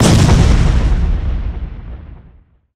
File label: explo medium, boomer explode; player; source engine; left 4 dead 2